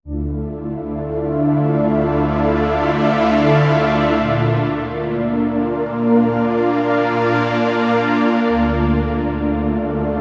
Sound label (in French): synthetiseur ZynAddSubFX